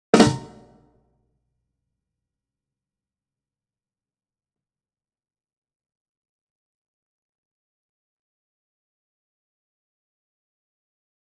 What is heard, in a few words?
Drums Snare Top